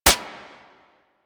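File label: submachine gun Shot; Warframe; Tenno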